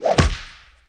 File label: Swoosh Punch